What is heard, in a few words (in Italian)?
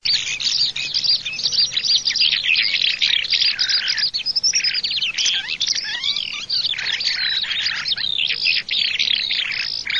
ALLODOLA